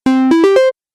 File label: Stage Level Complete; Video Game Sound Effects